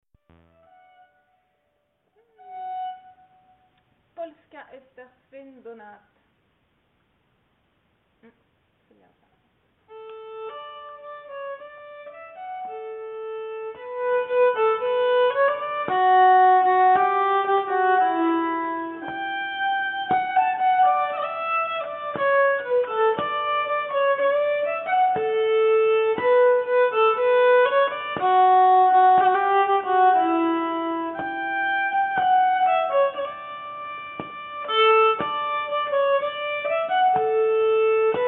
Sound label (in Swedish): Polska, nyckelharpa